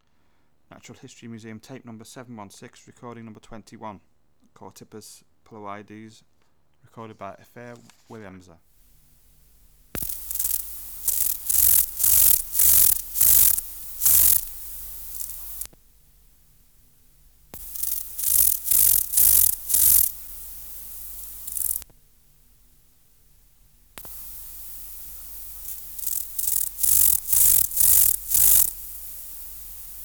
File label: Chorthippus pulloides